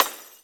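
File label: Glass Breaker, Drum Machines Alesis D4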